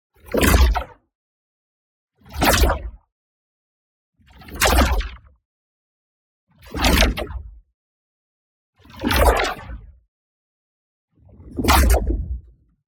ABILITY CHARGE High Zipper; boom library; Matter

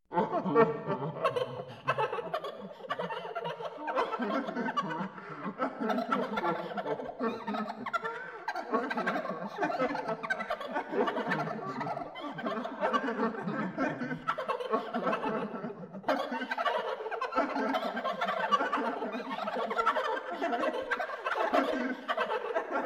Playful Ghosts; 1001 Sound Effects; Halloween Horror Sounds Effects

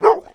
Dog Bark; Blind Drive

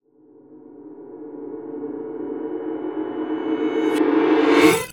Eerie Hallway, Cinematic Metal Impacts; RVRS

